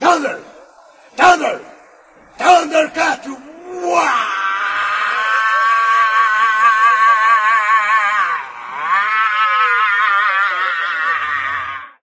thunder huuaaaahh; zoeira; thundercats

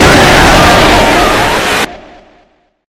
jumpscare sound; tfafd remake

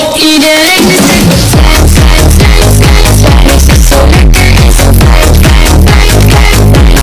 bass boosted

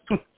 hmph

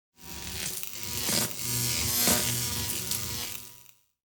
arcing electricity

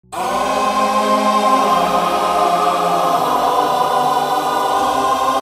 realisation music

